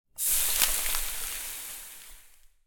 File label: Ailment Burn